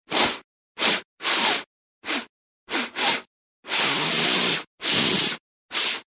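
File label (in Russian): вдохи носом мужские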